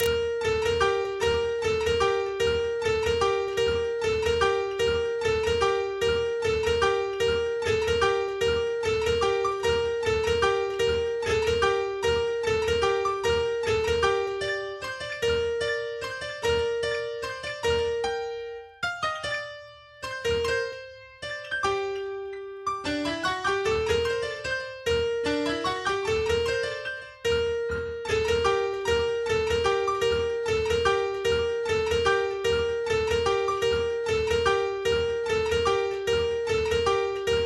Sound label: Carol of the bells violin version